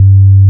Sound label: CLUB BS G3, CLUB BASS